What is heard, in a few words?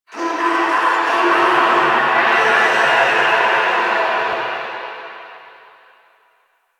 Infested Distant Sewer Grate Screams; Warframe; Ambience; Nineteen Ninety Nine; DE